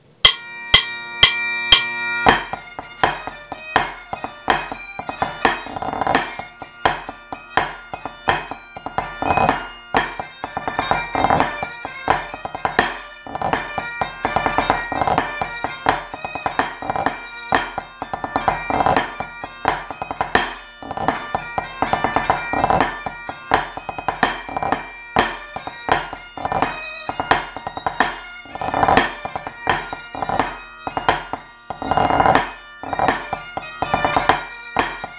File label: Trio